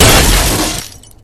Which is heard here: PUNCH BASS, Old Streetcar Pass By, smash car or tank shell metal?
smash car